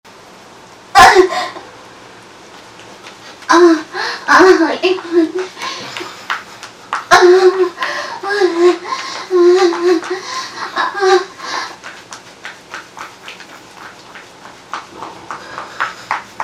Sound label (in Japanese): えち音声